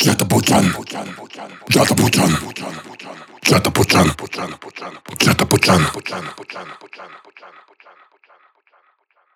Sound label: 138 Shamanic Voice (Wet), Psytrance